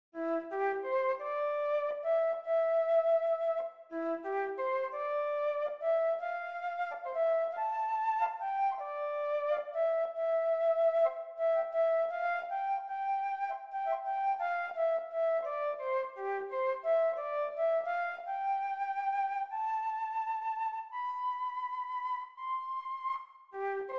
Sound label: Flute